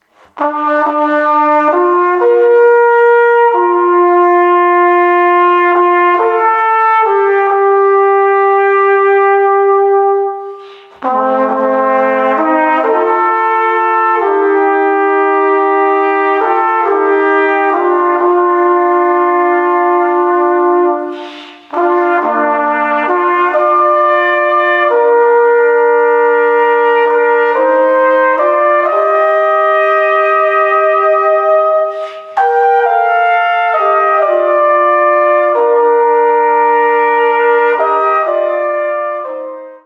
Am Abend Duett

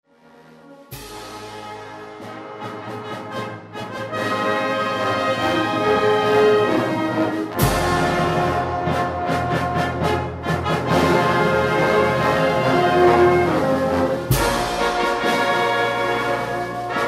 Festtagsfanfare

